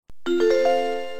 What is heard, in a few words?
Connect